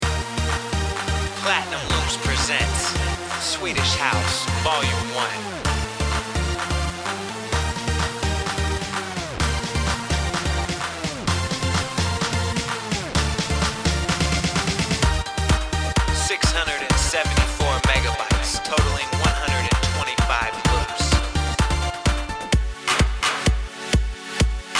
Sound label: swedish house